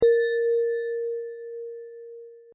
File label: bell